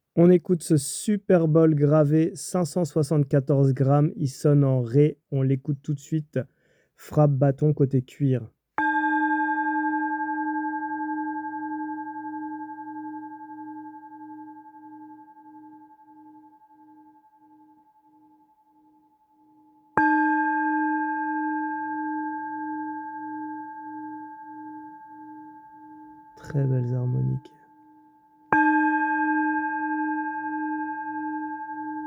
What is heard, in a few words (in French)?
Bol chantant tibetain grave Bouddha 7 metaux Re 145 mm